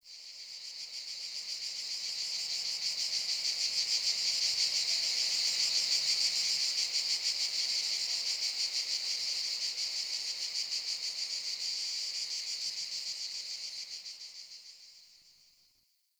wetland forest day cicada swell; Ambience

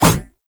Baby Step; Warframe; Nokko Colony; Visions

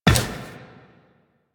Run Glass, Footsteps; Warframe; Tenno

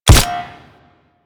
Rolling Latcher Embed

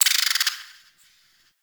Forest Distant Medium Creature Tree Small Call; Ambience; Warframe; Grineer Forest

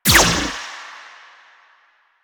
Choir Erupt Firing Eye; Warframe

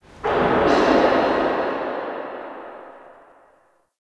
Subway Misc; Warframe; NineteenNinetyNine

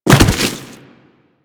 Bodyfall; Warframe; Kubrow; Bodyfalls

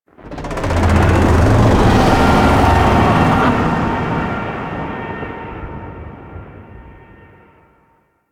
Void Dragon Vocal Distant Idle; Warframe; Duviri

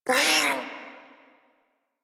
Feral Vocal Pain; Warframe; Catbrow

